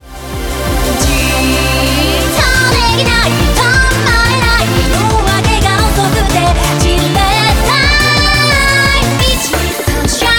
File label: eurobeat; onepiece